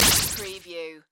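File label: Production Element Hit Warble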